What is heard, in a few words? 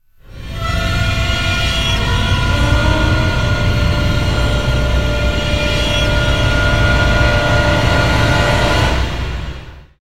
Zombie Stinger